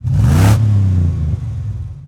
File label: Truck Explorer Rev; Trucks